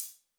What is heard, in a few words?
Sparkle Smoothie A, Hats; Airwindows